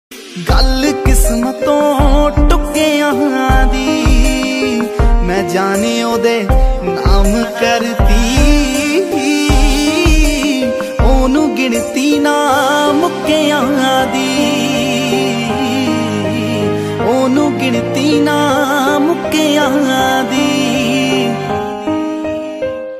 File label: punjabi ringtone